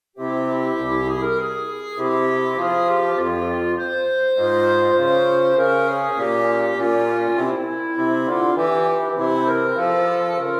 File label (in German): Andante Telemann Fagot Sonate; Clarinet